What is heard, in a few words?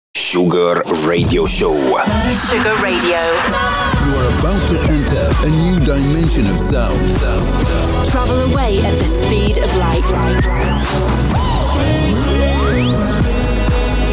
Jingle